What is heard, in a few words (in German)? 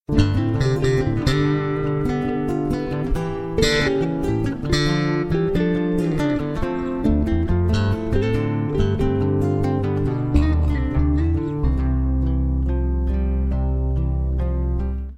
handy klingelton; peryton; mp3-handy-klingelton aus der peryton- klangschmiede